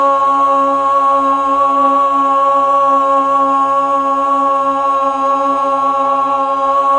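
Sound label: Fem C sharp 3, Mellotron Female Choir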